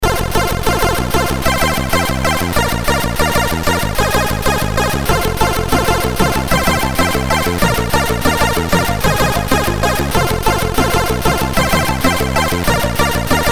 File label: sid MOS6581R4